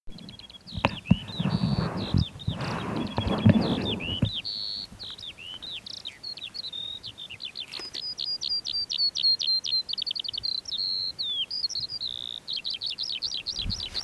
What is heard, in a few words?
lark on post